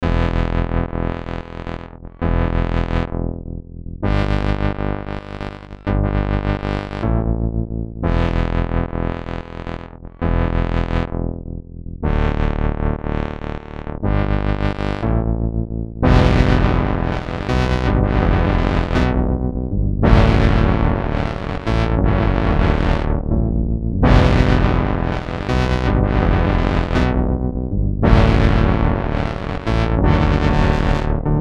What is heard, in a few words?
CMI V Loop to Cembalo; Arturia